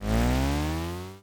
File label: Accelerate; Space Wave Race